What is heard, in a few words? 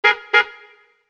BIP